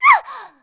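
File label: ghost damage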